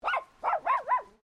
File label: Animal Dog Poodle Miniature Bark; Various domestic dogs; Dogs (Sound Effects:Animals)